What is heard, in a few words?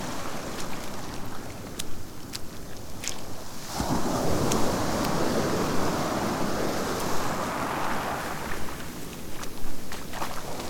Steps In Shallow Seawater Rocky Beach